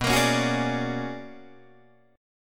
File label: B-Minor Major 13th B down Guitar DADGAD; strummed